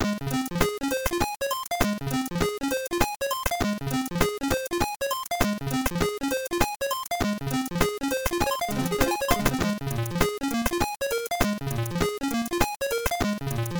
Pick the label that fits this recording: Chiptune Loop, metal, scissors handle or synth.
Chiptune Loop